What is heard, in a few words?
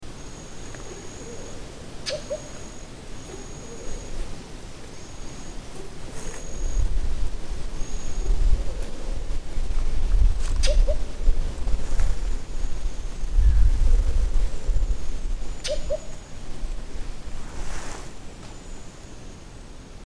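Philippine Coucal; Subic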